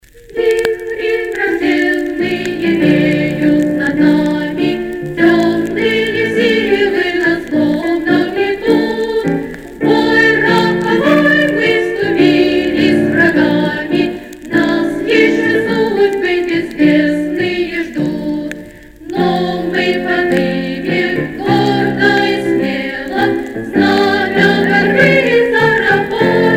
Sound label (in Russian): Варшавянка - Детский хор